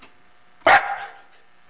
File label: bark, eskie; Peppermint